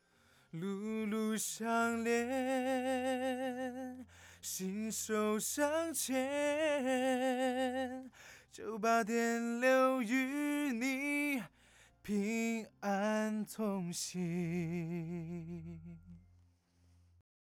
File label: Mac laptop